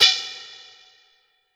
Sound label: Roland JV-2080 Breath Noise